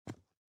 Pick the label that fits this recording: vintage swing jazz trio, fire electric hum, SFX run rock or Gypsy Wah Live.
SFX run rock